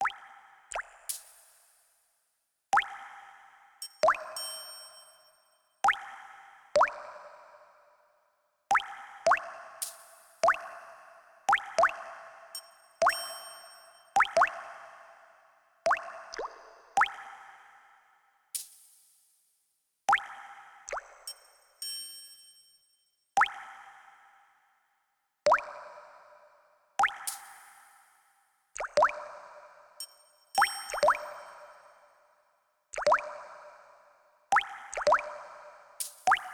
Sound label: water triangle test fdn, Water drops + Triangle, reverb